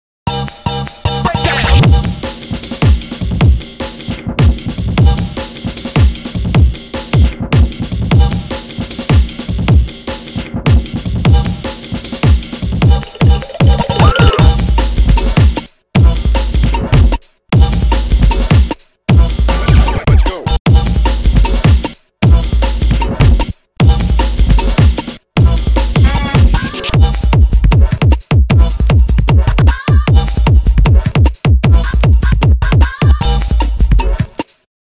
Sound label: Instrumental; Friday Night Funkin Funkin Peanuts; Double U